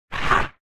Hiss; Barotraumatic; Shrimpbrut

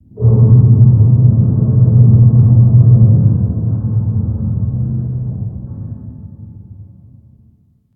Pylon idle; Barotraumatic

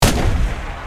WEAPONS chain Gun Shot; Barotrauma; EK modern

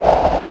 crawler damage; Barotraumatic; Cthulhu; InsanityMonsters; Hallucination1

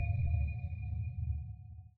sonar Tail Suit Medium Directional; Barotrauma; Real Sonar

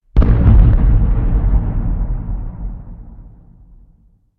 Pylon far; Barotraumatic

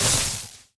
shield hit; Barotraumatic

